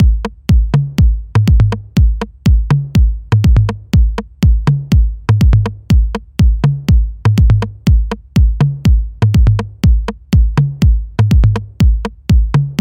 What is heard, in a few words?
detroit techno loop